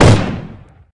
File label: Shotgun Blast; Lethal Company; Windows